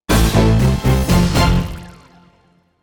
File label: Duet (opening) (A minor)